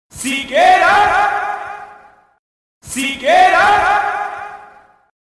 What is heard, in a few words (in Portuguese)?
vinheta; sikera